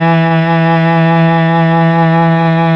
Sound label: MEL CEL H E 4, mello cello